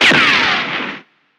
gambler mouseclick shot; gambling; Wild Guns

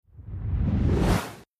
ROTATE LEFT; WetAndJuicy

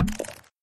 autoplay on; buttons; Xing Yun Jin Chan

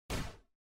boom; Thunder Fighter

